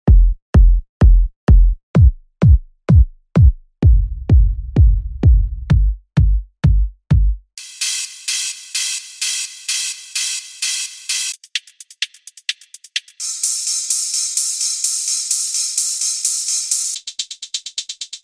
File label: techno; intellect techno vol2